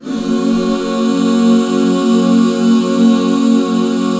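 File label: Gb major; Best Service Gigapack 1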